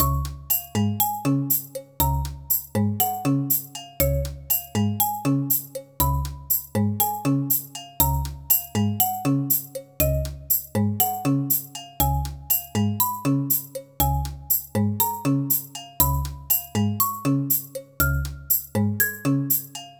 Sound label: Mood Music